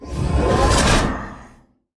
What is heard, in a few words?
heroes spine Rain cast; bonehart